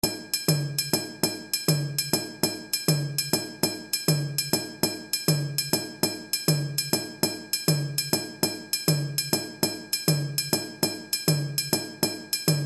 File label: KASSA Sangban